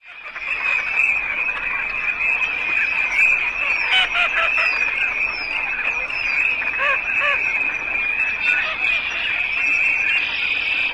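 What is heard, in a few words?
large flock, green-winged teal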